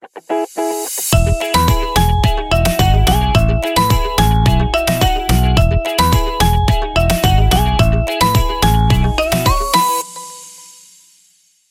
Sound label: Happy Indie Logo, ringtone; MIUI12